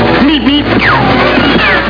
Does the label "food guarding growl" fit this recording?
No